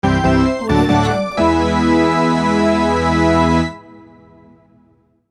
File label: retro game win